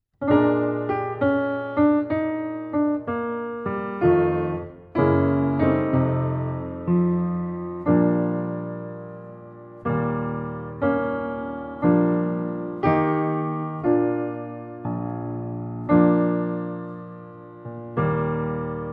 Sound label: blues for beginners begleitung; froehliche klarinette